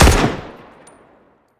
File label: negev, weapons; Counter Strike Model Pack; CS:GO